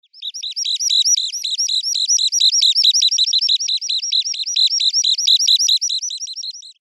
Temminckstrandlaeufer Calidris temminckii, Vogelstimmen

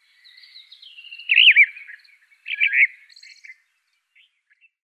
Einfarbdrossel Turdus unicolor; Vogelstimmen

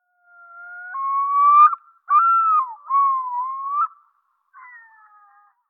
Gelbschnabeltaucher Gavia adamsii; Vogelstimmen

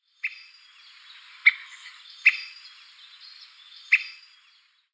Dreizehenspecht Picoides tridactylus; Vogelstimmen

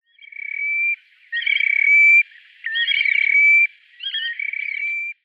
Terekwasserlaeufer Xenus cinereus; Vogelstimmen

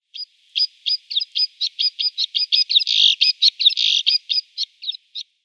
Schneesperling Montifringilla nivalis; Vogelstimmen

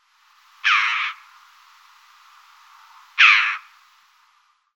Alpenkraehe Pyrrhocorax pyrrhocorax; Vogelstimmen

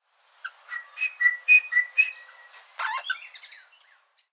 Prachtrosella Platycercus eximius, Vogelstimmen

